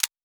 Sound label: click; Loupedeck Sound Pack